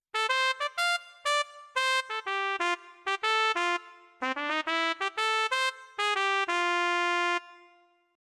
CTK4400 Trumpet

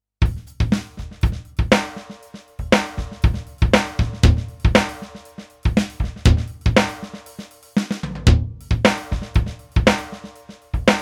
Real Groove

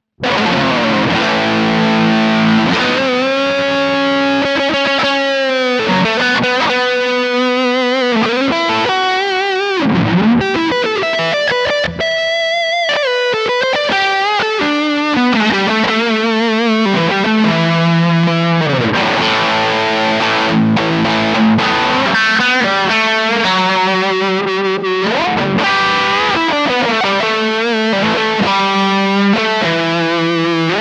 Danelectro 59M NOS Highgain

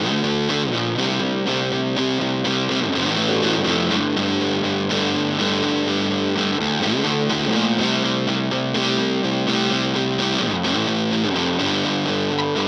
JHS Cheese Ball Mode 2

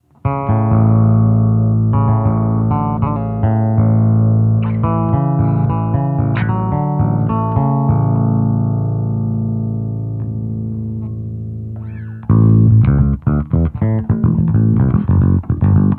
Acoustic

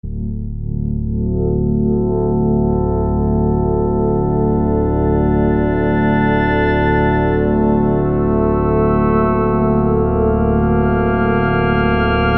Kaox FM Pad

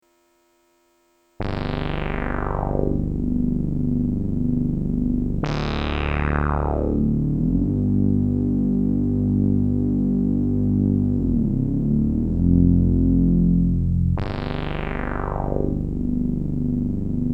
Moggy Sweep

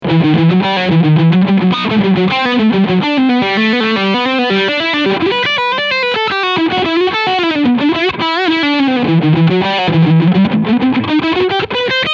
Fender AM Pro II Strat HSS Leadsound Humbucker

